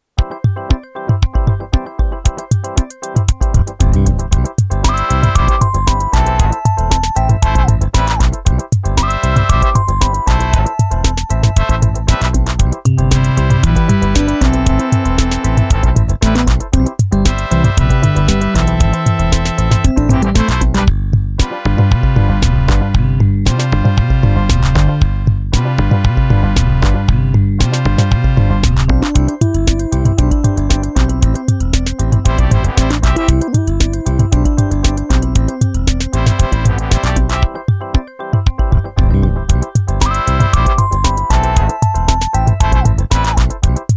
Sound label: round funk